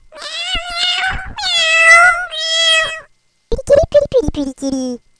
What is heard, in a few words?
KITTY